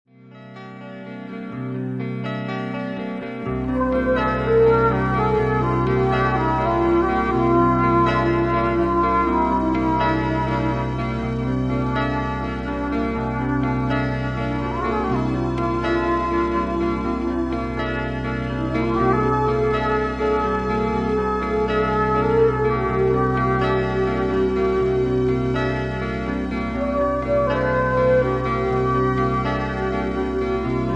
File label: flute